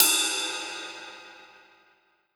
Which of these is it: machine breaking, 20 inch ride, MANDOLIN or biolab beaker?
20 inch ride